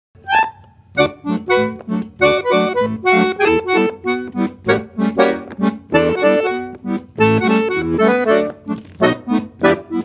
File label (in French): accordeon; Les instruments de musique